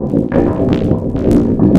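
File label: blood portal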